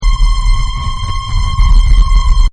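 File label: AMB LOOP, Sci Fi Atmospheres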